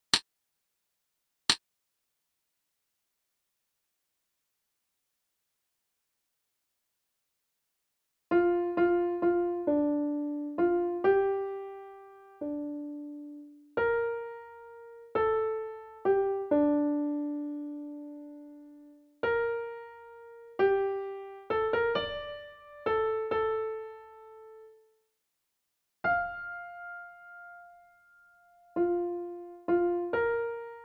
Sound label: evensong melody